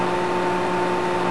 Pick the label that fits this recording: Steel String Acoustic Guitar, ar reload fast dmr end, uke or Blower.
Blower